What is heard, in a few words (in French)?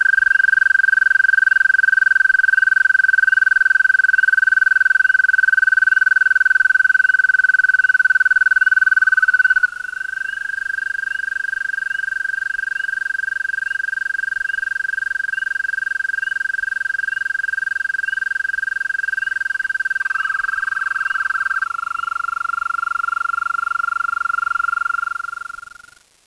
crapaud amerique